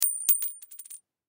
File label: Coin Drop Quarter Cement; Money; Foley; Blastwave